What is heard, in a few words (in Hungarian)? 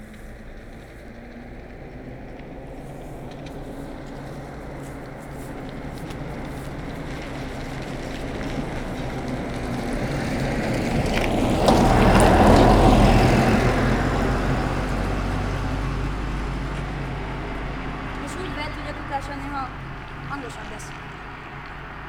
niva, személygépkocsi; varboc